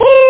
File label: duuu high; OctaMED SoundStudio V1; instruments